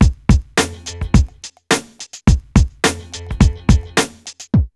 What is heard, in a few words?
Burning Grunge Hip Hop, RNB 106; USB Soundscan vol.34; AKAI